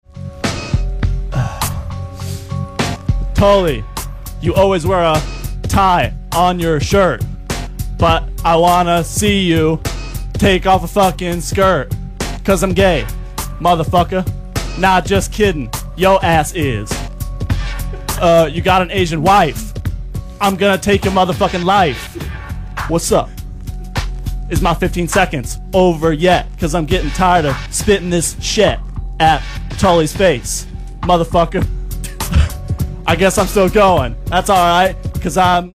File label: rap battle